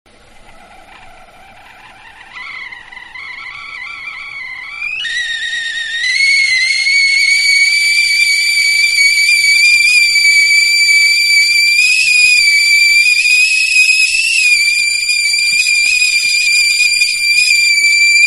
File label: Tea Kettle-Boiling; Food Prep (Sound Effects:Restaurants); Manhattan Production Music